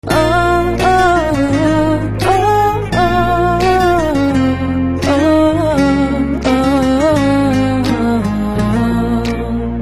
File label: BG Humming, Telugu Ringtone